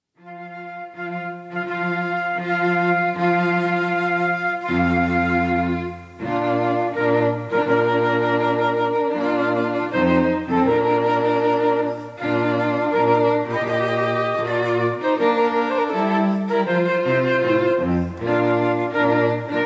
Wagner Bridal March, string quartet

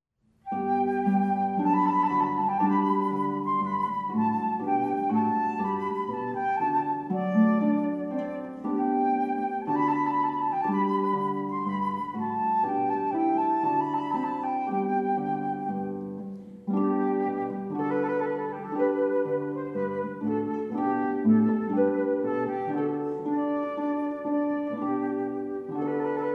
Flute and Harp; Classical; Trumpet Voluntary

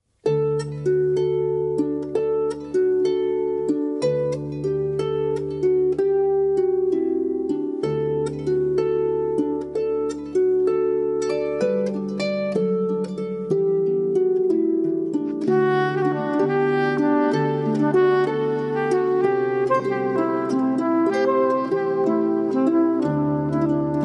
Celtic March; Celtic Duo

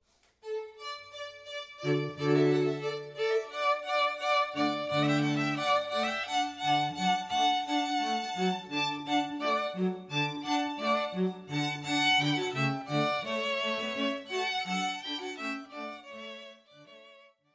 String Quartet

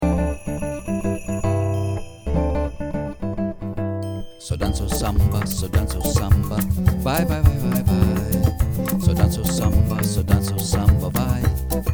Caribbean Steel Drum Band

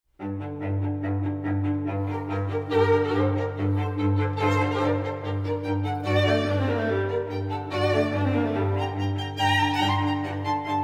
String Quartet

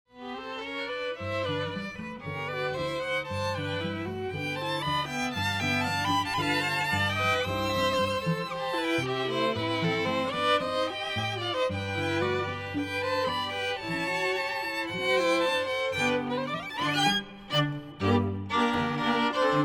Tango, string quartet

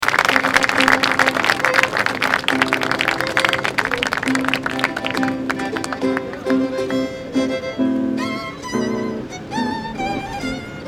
Wedding March Jazz style Violin guitar mandolin; Catherine Boyd

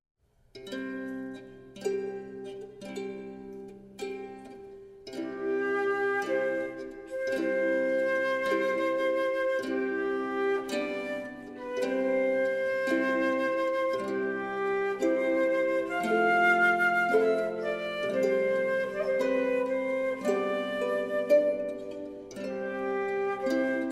Harp Flute Bridal March

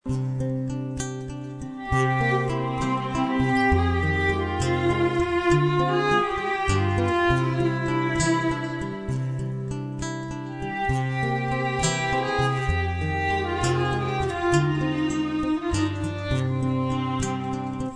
Guitar n Violin; Classical

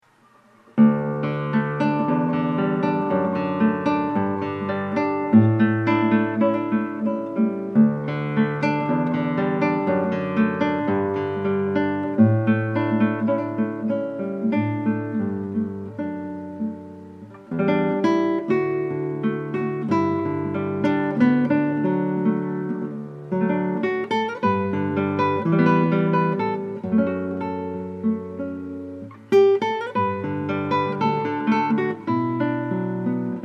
Acoustic Guitar

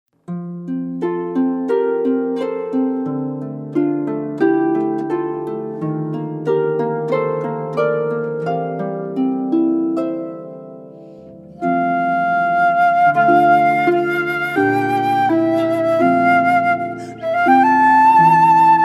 flute and harp; Fairest Lord Jesus; Christen Stephens

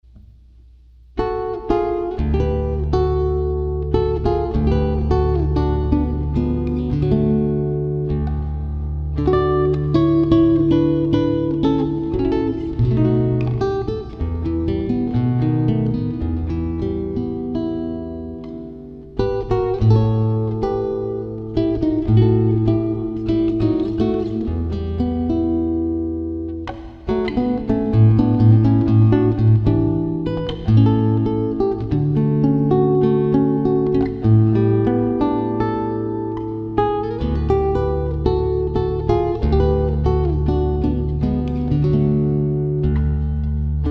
guitar; GQ Guitar Services

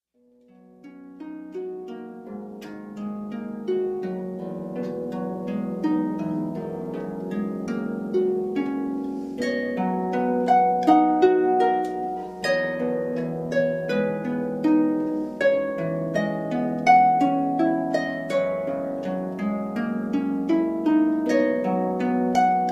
Largo, Solo Harp; Largo from New World Symphony by Dvorak

